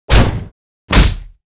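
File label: Mob attack; SubBossmob; 욱일승천류; 겐지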